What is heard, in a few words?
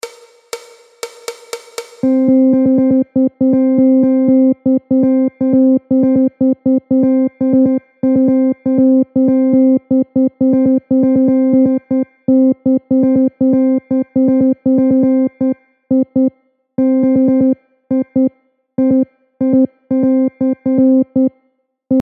8th rhythm